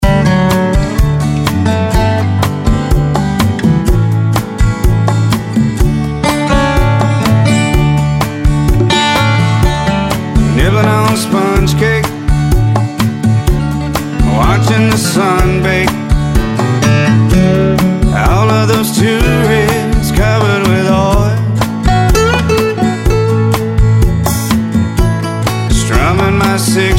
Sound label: SOLO ACC GUIT